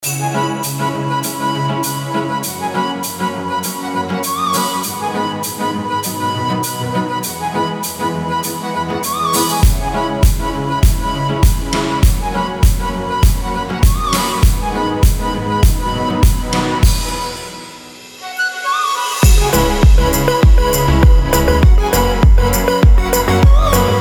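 cheerful melody beautiful melody that can be a ringtone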